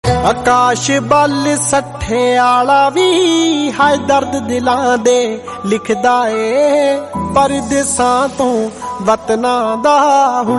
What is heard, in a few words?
gun shott punjabi